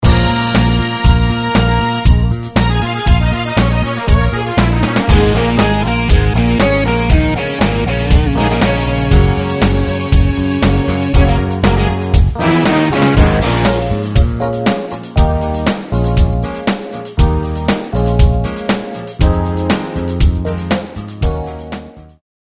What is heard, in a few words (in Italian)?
Karaoke base musicale